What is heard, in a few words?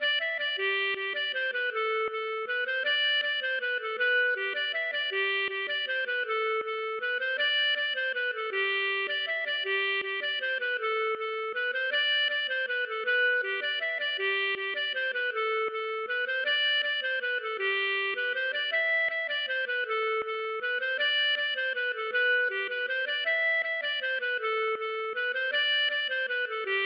mazurka